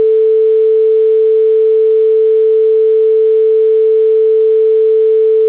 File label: sine